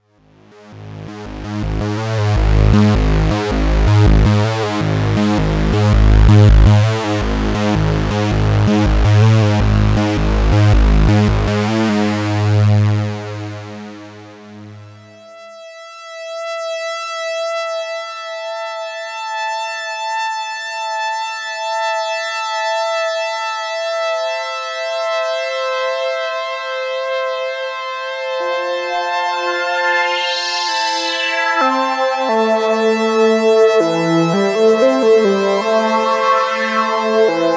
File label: four voice; opxpro